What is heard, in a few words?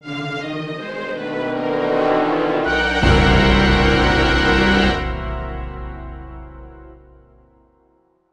Sitar